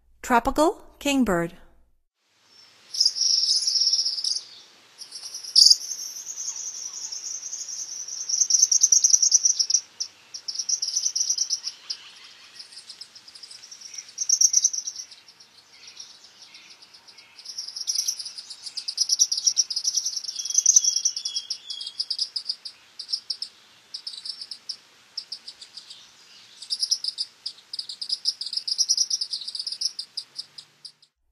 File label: Tropical Kingbird, Songs of Birds of North America; Stokes